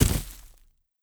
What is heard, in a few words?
grass; Rust